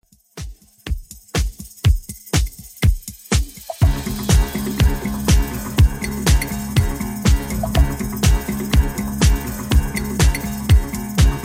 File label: 70s Redrum 122bpm